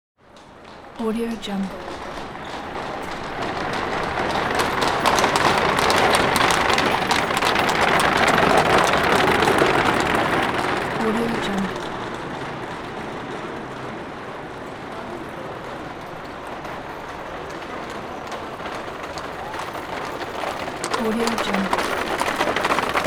various horse drawn carriages pass by on cobble street; hollywoodedge